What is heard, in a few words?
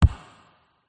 hit impact thud thin reverb; sound design